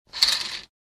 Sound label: Sheer curtain slide soft swish; blinds